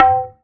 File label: phreaky perc, Percussion; Trance; Drums; Trance Explosion Vol1